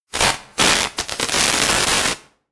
sparks synth dense

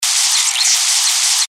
future electric arcing and swoshes

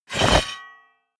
metal blade large pickup

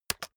keyboard external space hit fast

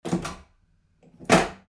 door

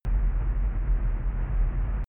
drone spaceship large rumble

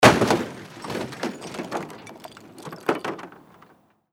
car falling off cliff

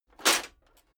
metal plate armor movement single

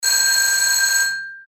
phone old

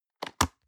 plastic box food small close medium

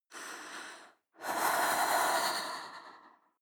breath female shiver

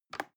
plastic button little push regular

